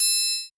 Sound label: Open Triangle; Zoom RT-123